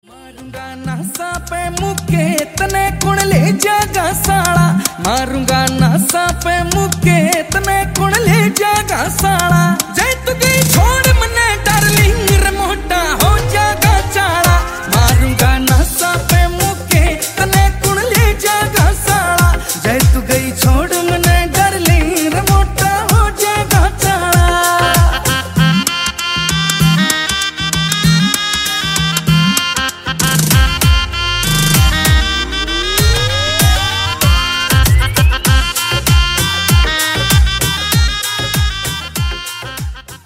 Haryanvi

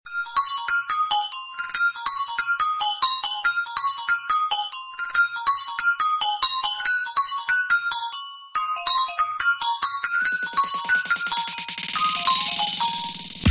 google sms tone

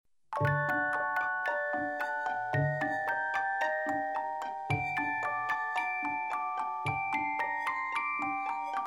music box sms

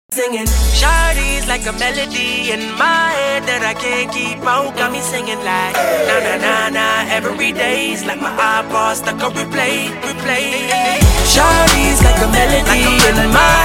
ringtone; dj hero

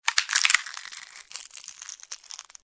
alien egg smashing sound effect

